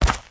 footsteps; smite; god merlin

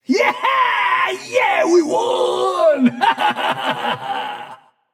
yay

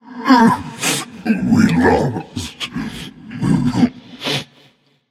boo hoo; smite; arthur

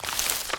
vine pulse; smite; god artemis

